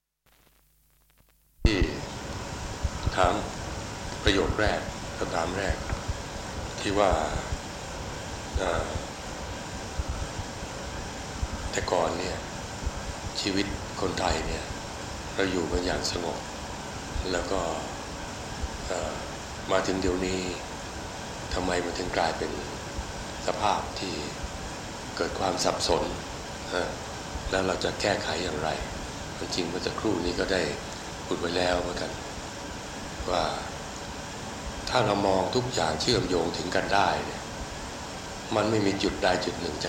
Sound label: Ocean Spheres